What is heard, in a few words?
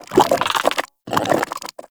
Vocal Idle, Shrimp Lantern, Creatures; Baliq